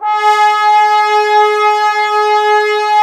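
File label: brass trombones G#, Woodwind Brass Ensemble; 90 s Sample CDs; Miroslav Vitous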